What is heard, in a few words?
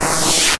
Zap